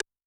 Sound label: An S Stick, Yamaha DTXpress-II snare